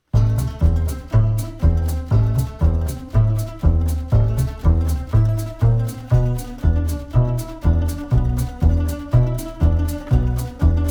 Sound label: Petriarka Underscore, Jazz Manouche